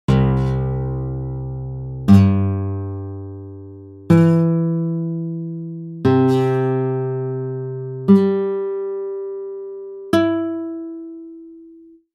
Open C Tuning

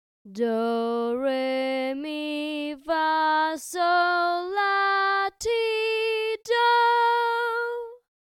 solfa scale; Melody 4 Solfa Scale

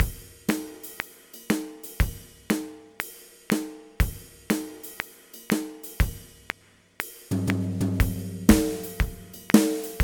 Jazz Swing BASIC FULL

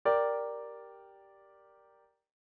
Minor Chord Progressions Example 3 G MINOR

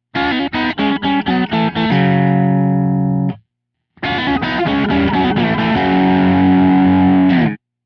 Crunchy amp with added distortion

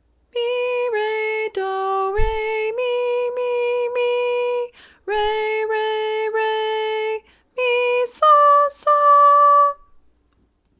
Mary in G with Syllables

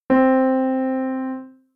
easy melody start note

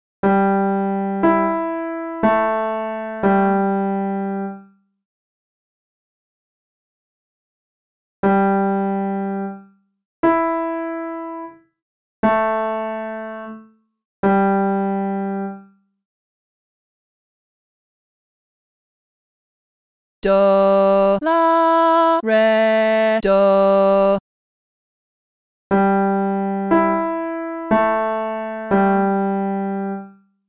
Medium Solfa Melody

